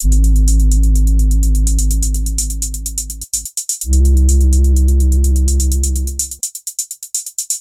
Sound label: ring